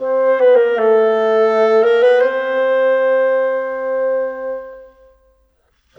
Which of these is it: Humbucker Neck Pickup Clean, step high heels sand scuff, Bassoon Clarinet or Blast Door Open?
Bassoon Clarinet